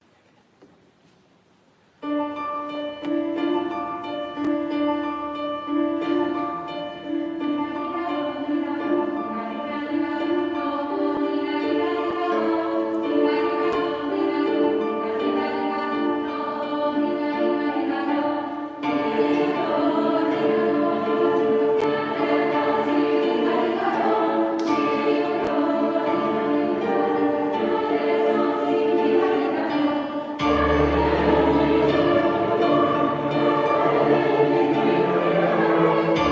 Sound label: Ding a ding a dong, villancicos